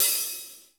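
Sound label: DRY KITS, dry overhead; Crypton; DrumBase5000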